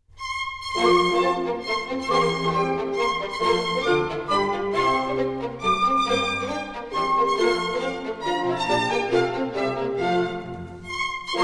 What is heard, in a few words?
Minuets, F major; Mozart; Dances and Marches